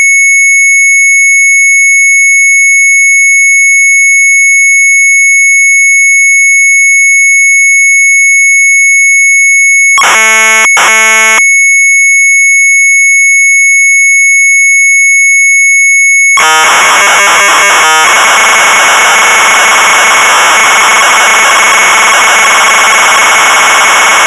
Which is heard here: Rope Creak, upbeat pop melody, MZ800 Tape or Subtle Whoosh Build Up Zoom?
MZ800 Tape